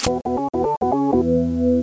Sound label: Sticker Place Sound; Coco Sticker Sport; Fisher Price Ready for School Kindergarten